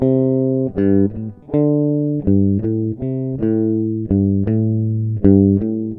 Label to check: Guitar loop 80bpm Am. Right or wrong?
Right